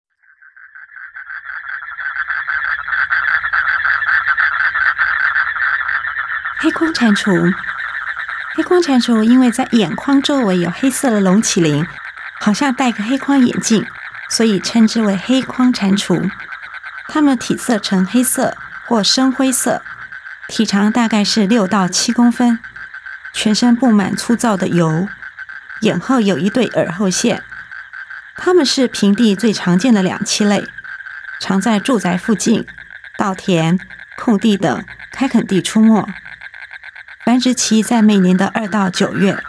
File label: Duttaphrynus melanosticus